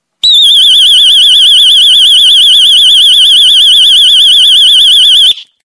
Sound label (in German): innenraumsirene; SIRENEN; SI9311